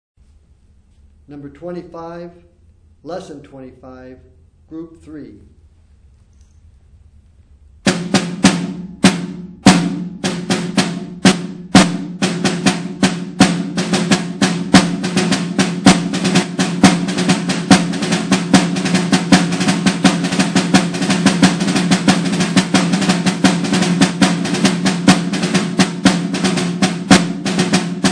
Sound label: snare rudiment